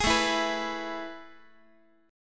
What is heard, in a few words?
A Major 7th Suspended 2nd, Guitar; AM7sus2 strummed; JGuitar